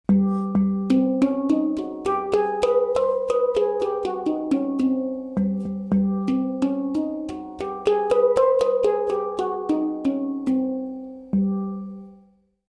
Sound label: Kourd Atar Todi, hang